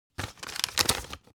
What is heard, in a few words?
paper old flip page fast; foley; smartsoundfx